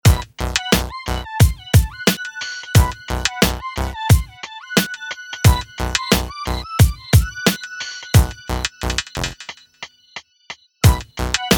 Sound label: ruins loop; retro detonation